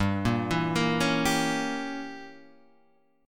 G Diminished 7th G down Guitar Half Step Down

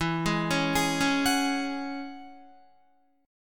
E 6th Add 9th E down Guitar; E6add9 arpeggiated

